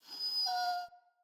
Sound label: Wolf Cub Trapped Call Close; Animals; Wolf Cubs; Orengal Wolf Cub A